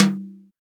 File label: hi tom single hit g key; tom drum samples